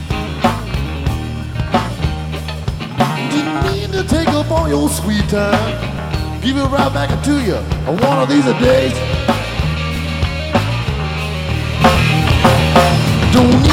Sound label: solo